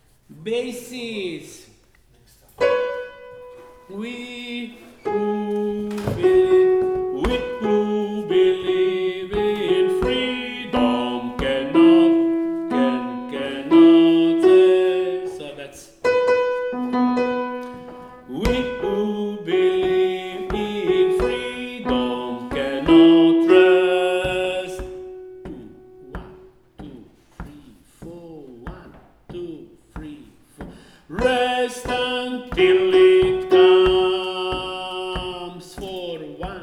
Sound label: Bass